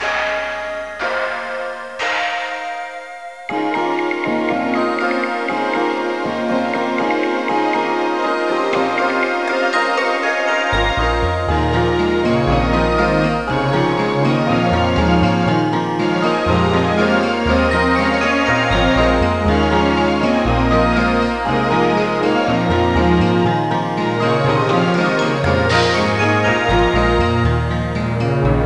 8bit asian ethnic

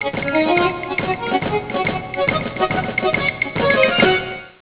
traditional irish

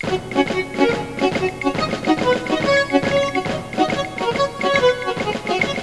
8bit traditional irish

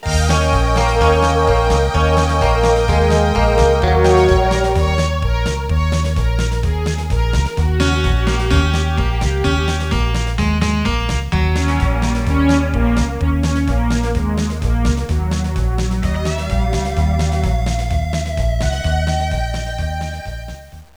8bit country

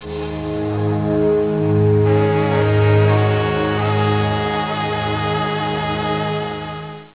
romantic

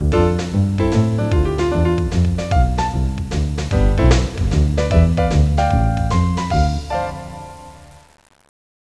8bit jazz

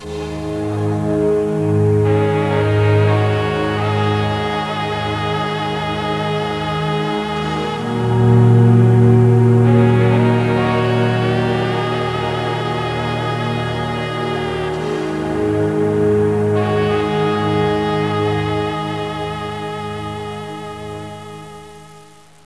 8bit romantic

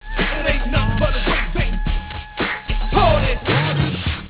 rap

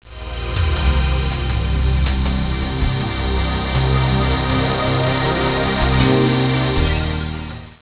new age

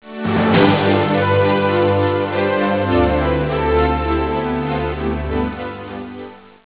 orchestral

